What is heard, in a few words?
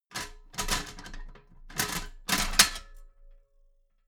metal ladder small handle unfold heavy